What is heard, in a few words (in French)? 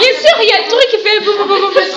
boum